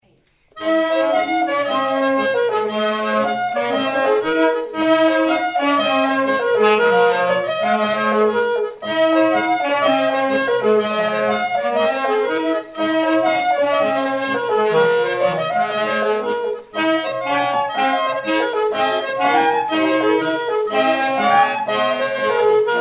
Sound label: Swedish country danse